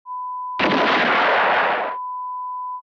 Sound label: Gun Shot sound